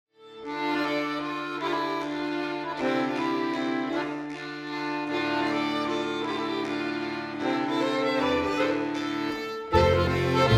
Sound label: Mazurka